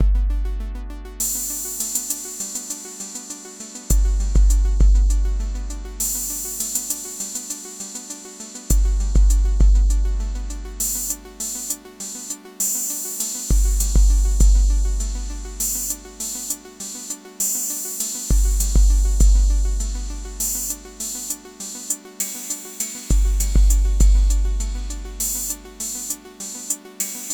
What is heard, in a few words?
Drum Study